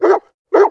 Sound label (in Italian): Cane